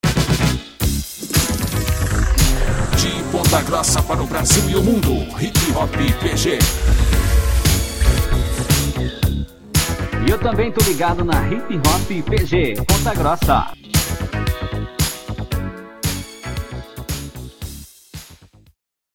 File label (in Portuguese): Rap, Hip Hop; Web Rádio Hip Hop PG